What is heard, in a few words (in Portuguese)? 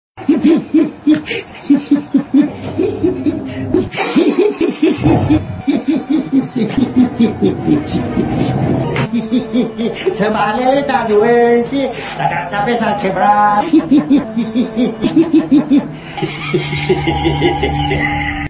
dando risada; nilo da avenida brasil; risada do palmeiras